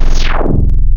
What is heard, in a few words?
Digital Sweep; Wavetables; Synthetic's Serum Collection; ESW Core Wavetables; Echo Sound Works Core Tables